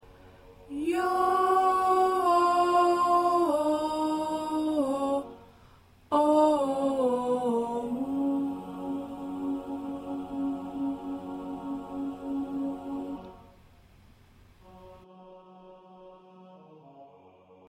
Soprano, choir parts

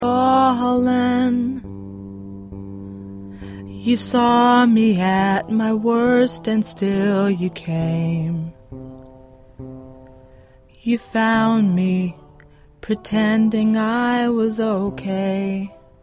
Voice

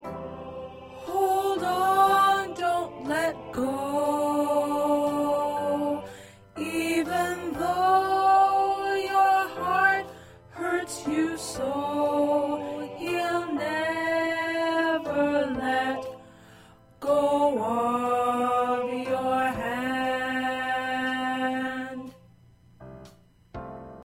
Soprano, choir parts

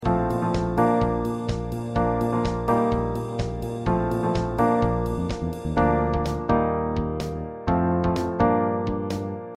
Accompaniment

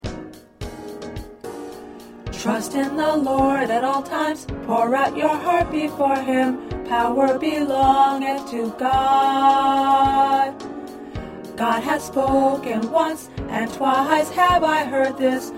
Tenor

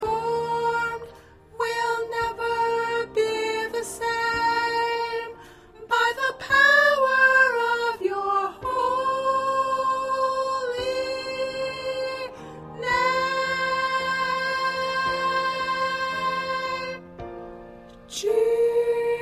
Soprano, gospel songs; practice tracks

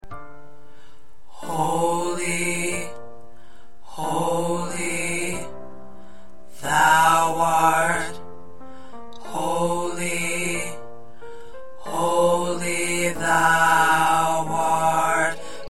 Tenor, choir

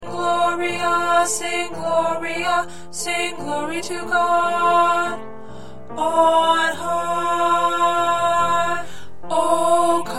Alto, choir

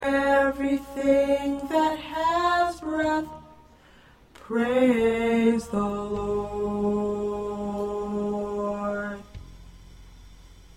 Soprano

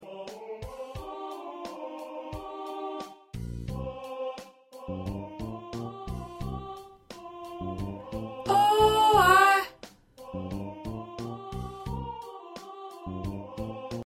1st Tenor

